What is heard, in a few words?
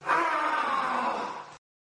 orgasm classic; kztwmaps